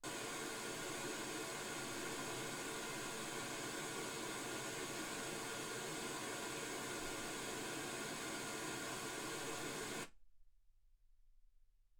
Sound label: wgn